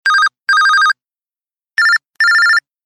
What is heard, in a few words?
Tone; LG; LN510-Rumor-Touch